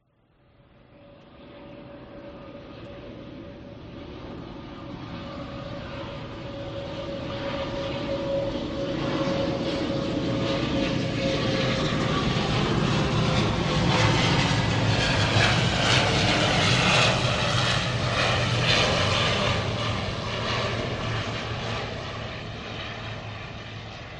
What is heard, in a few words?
vehicles airplanes military fokker 50 propeller aircraft fly past slight dist